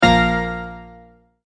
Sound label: Multimedia Tone-Stop